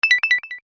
UI Rollover Minor, User interface feedback sounds; Computers; Electronics

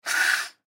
Baritone Spit Valve Blow; Brass; Musical; Blastwave

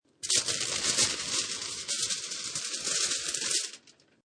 Metal Foil Aluminium Foil Open; Ripping

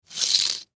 Pill Packet Sheet Slide Scrape Counter Top; Foley; Pill Packet Sheet Movement Slide Medicine; Blastwave

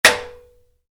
Wood hit aluminium, Wood, Light Impact: Aluminum; Materials; Stuart Duffield

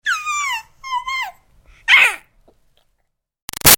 Baby 6 Month Old Talk Squealy; Babies; Voice; Big Room Sound

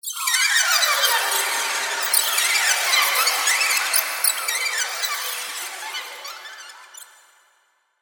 Creature Squeak, Horror, Creature, Squeak, Eerie; Monster Sound Effects; BlastWave

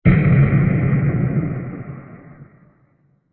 Large Bright Explosion With Reverb; Explosions; Crafted Signals

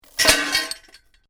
Metal Junk With Chain Clanks Rattle; Metal pipe with chain attached rattles and drops; Metal Sound Effects

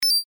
UI Rollover Minor, User interface feedback sounds; Computers; Electronics

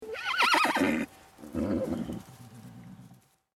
Horse Neigh Young Foal Whinney; Cute horse whinney / neigh sound from a young foal; Horse Sound Effects

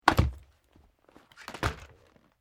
SKI BINDINGS BOOT UNLOCK REMOVE; Snow Skiing; Skiing and Snowboarding (Sound Effects:Sports)

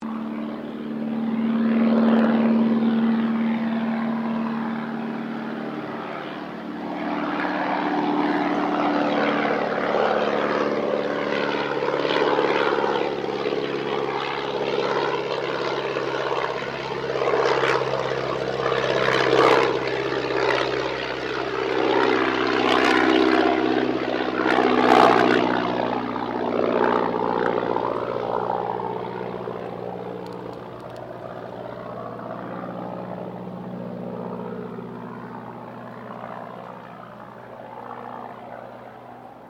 AIRCRAFT DH82A DEHAVILAND TIGERMOTH PASS

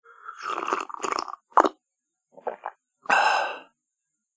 Slurping A Cup Of Tea

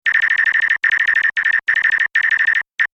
Beeps Data Transmission Technology

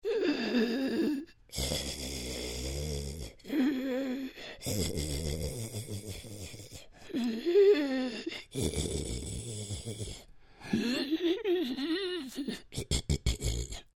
Creature Troll Breathing Wheezy; Monsters; Horror

